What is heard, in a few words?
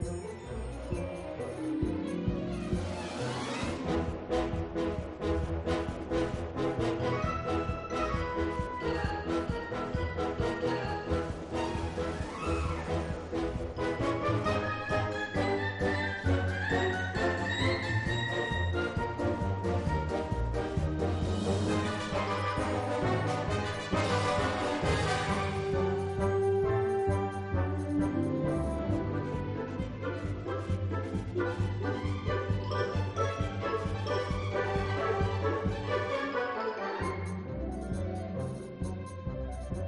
Minigame Kungfu Fight Loop; Ice Age Village; Android iOS mobile gamerip 2013